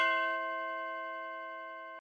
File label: Instrument